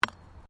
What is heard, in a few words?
Digging Small Handheld Tool Rocks